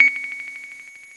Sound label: Ultimate Beep; cstrike; War3